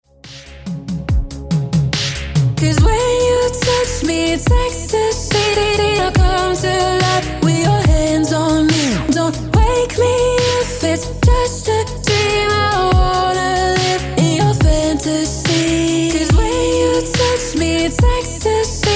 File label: ELECTRO POP